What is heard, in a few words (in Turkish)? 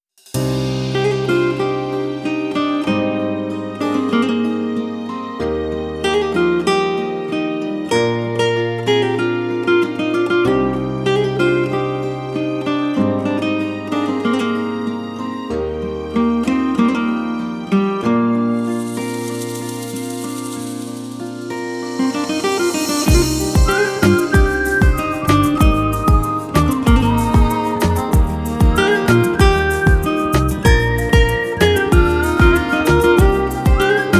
enstrumantal uzun versiyon